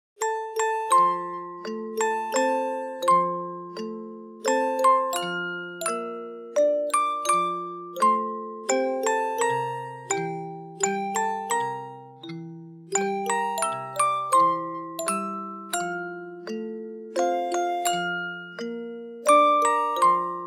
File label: Lullaby Wiegenlied Arranged for Music Box; Brahms; Op. 49 No. 4